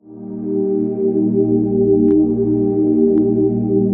122 BPM Pads Amaj Gmin Tonic A, ambient synth pads; MMB